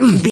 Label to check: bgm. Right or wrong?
Wrong